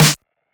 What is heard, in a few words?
torn snare